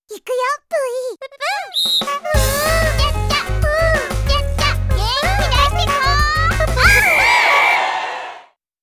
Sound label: Fight Cheer (Japanese); Grandia